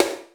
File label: POP SNARE; Crypton - DrumBase5000; BABY KITS